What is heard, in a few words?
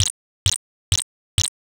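footsteps grass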